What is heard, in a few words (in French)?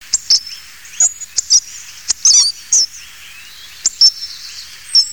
grosbec